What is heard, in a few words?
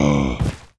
mummy death; spawners mobs